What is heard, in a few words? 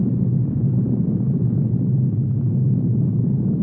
rocket; SGI O2 Out of Box Experience 2.2; cyber astronomy; jupiter